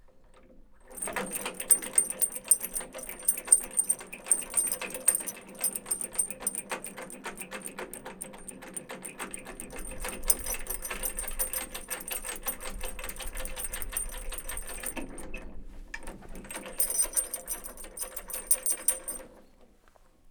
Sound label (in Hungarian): akasztó lánc csörgetése, kerekes kút; varboc